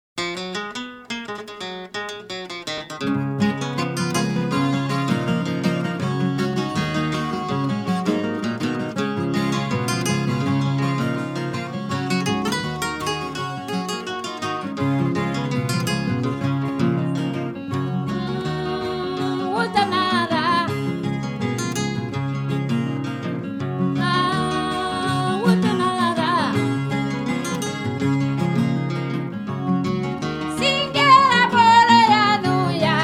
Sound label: Desert Blues